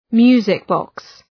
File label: music box